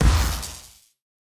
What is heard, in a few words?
hit shock medium; CrossCode; battle